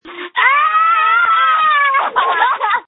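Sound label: Scream, phone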